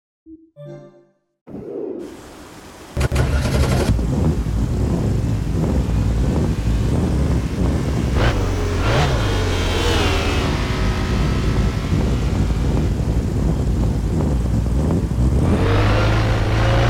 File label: bmw m3 e46 gtr